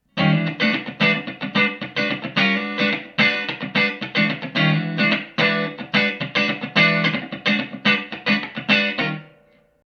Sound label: clean